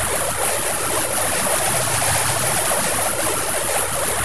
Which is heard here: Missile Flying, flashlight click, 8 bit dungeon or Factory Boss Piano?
Missile Flying